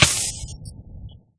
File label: fire hurt, player; Minecraft